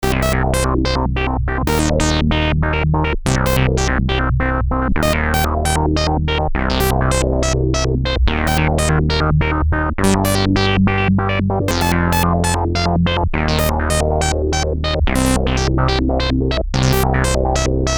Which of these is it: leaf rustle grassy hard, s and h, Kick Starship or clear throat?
s and h